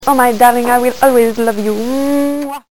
love kiss

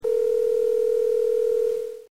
DIAL RG

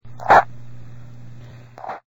pulse

